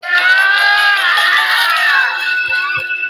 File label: screams